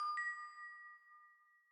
Unlock

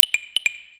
Percussion, notifications; Coolpad; Tiptop-N2M

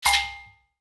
Tribal open; Nokia; audio themes

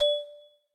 abstract tiles lock, ui; Samsung; Galaxy Note Edge

